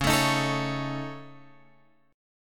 C sharp Suspended 2nd Sharp 5th C sharp Guitar Drop D, chord